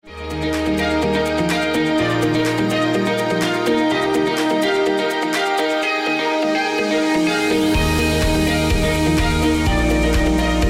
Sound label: Uplifiting Corporate